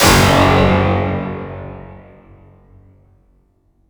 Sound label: Cinematic impact Sci-fi; MatiasMacSD; DISTORTED PERCEPTION